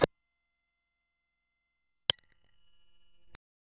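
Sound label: transition extremely rapid, clipping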